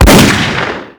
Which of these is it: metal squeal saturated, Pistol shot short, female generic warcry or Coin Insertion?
Pistol shot short